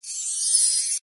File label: wild hit; Helloween; slottiger